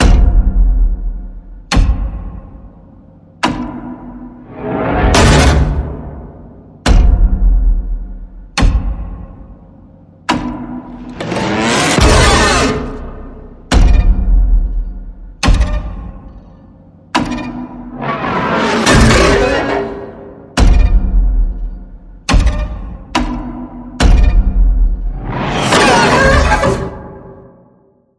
Stop and Scare Plucking

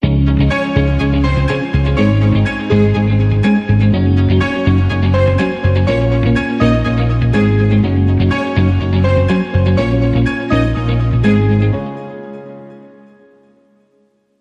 Positive Team Motivation, No Drums

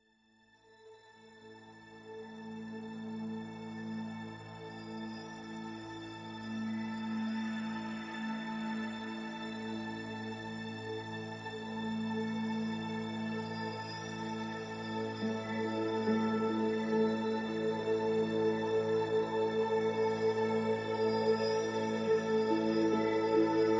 Mystical Breeze No Woodwinds

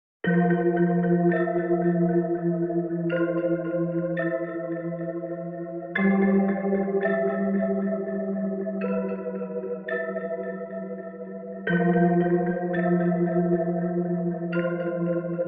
Arpeggio and Voices

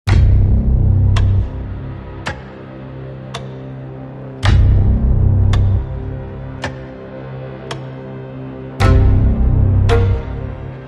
No High Strings

